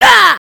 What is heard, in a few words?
minifig damage; foley